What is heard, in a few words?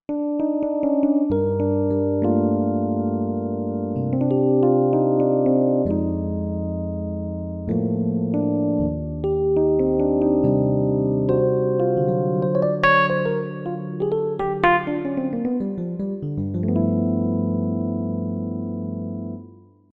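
Rhode, synth piano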